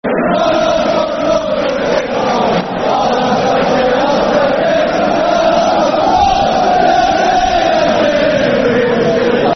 Football Chant (Soccer Song), Newcastle United; Blaydon Races (Chorus Only); Nufc

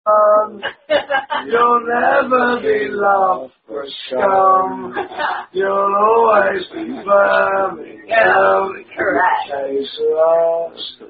Rugby Song; Unknown Rugby Song

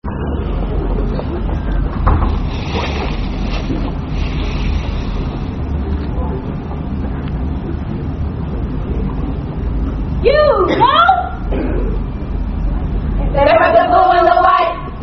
Sororities Greetings; Phi Beta Sigma Iota Phi Theta; Parody Of Fraternity Chants

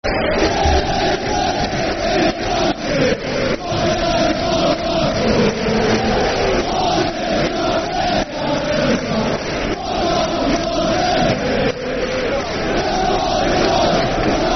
Football Chant (Soccer Songs); Hartlepool United Hufc

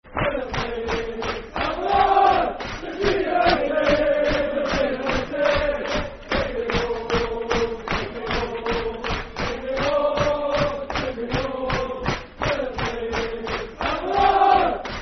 Football Chant; Dundee United Dufc

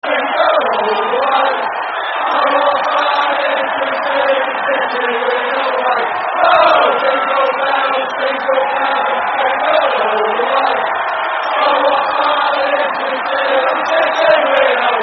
Jingle Bells, terrace chants; Jingle Bells City; Man City

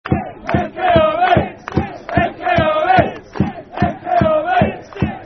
Army Football Chant (Soccer Song); Mk Army (Incie's); Milton Keynes Dons Mkdfc

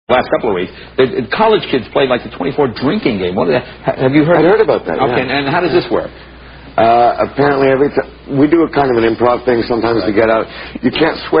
Drinking Game

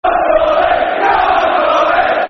Come On Derby Football Chants; Derby County Dcfc

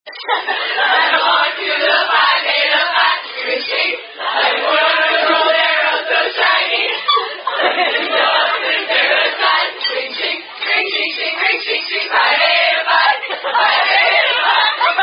Phi Phi Ring Ching Ching Song; Ne Gamma Pi Beta Phi Rings The Ching

